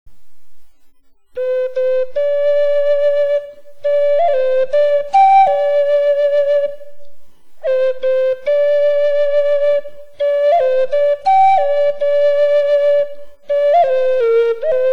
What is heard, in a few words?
ocarina sound